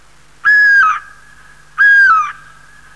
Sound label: Clamator glandarius